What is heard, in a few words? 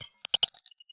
Multiple UI Beeps